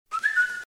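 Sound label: short whistle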